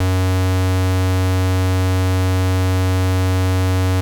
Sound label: square, 808, SubOsc